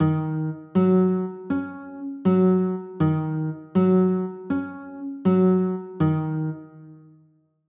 e flat m7, chords

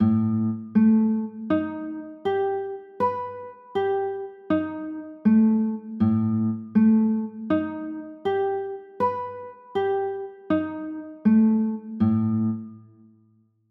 a 9b5, chords

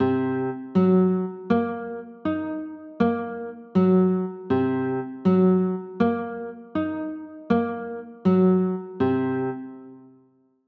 c m7b5, chords

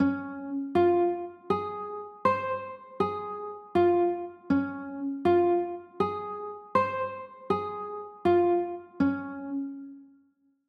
d flat maj, chords; d flat maj7

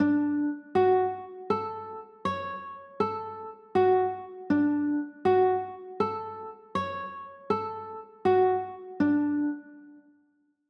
d maj, chords; d maj7

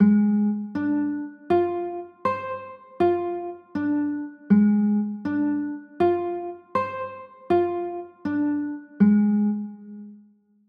b flat 9, chords

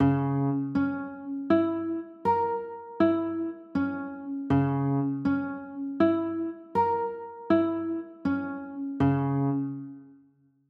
d flat m6, chords

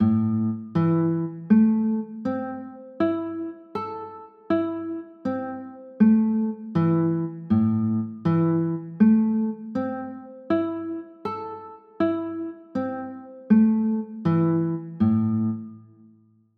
a m, chords

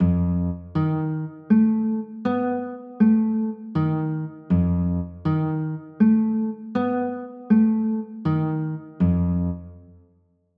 f sharp 9; f 7 sharp 11

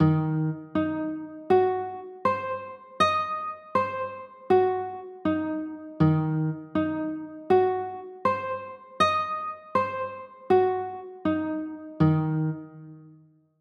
d sharp m6, chords

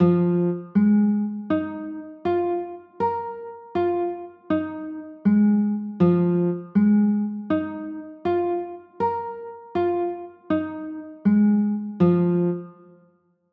f m11, chords

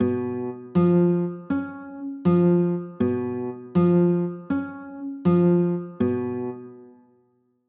d flat 7

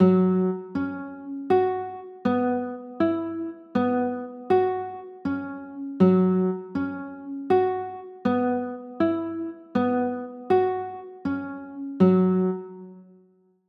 f sharp m11

